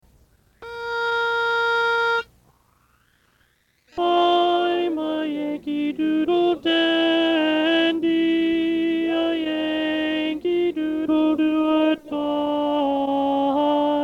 Tenor

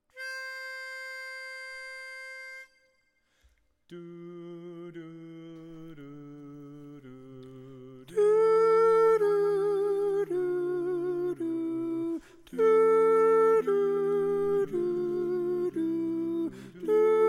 Tenor

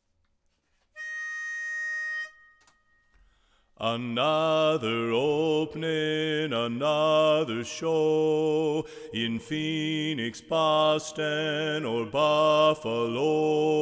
Bass

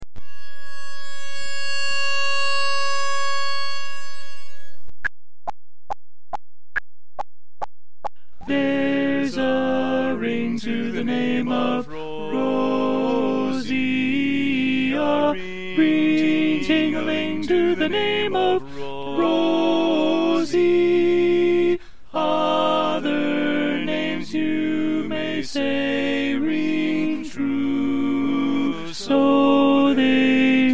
Tenor

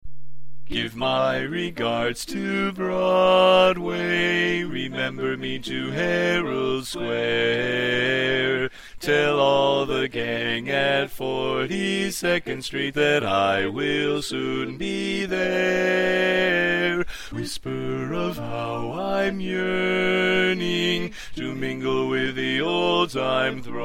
Bass

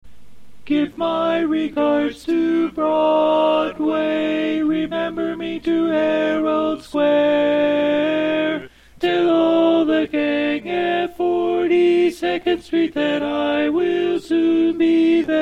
Tenor

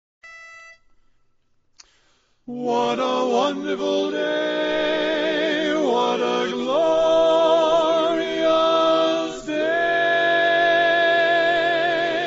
Lead

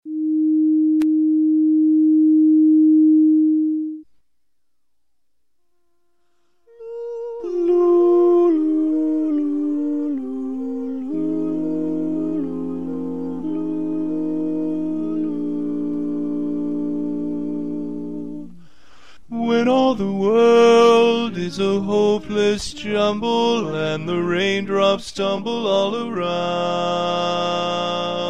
Rainbow Lead

